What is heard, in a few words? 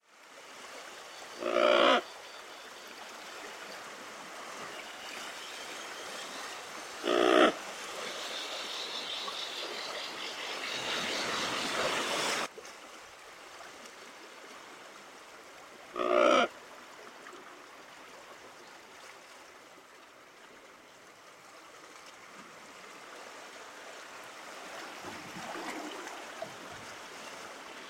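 thick-billed murre voice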